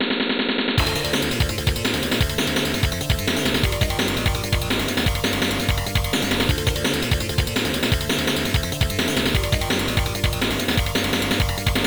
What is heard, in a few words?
Timeout; gunmaster arcade